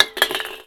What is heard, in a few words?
Soda Can Drop